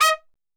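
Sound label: D#3 trumpet shot, Brass Super Section; Best Service; TRP SHOT; 90 s Sample CDs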